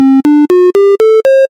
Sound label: diatonic scale